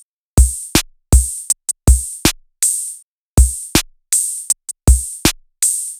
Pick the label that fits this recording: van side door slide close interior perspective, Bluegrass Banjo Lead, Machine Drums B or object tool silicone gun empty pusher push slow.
Machine Drums B